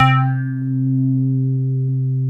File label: SYN, Analog; Sound & Vision; Gigapack I CD 2